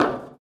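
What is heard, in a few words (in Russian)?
крепкий мгновенный полновесный удар по дереву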